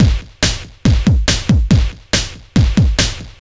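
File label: drum loops, right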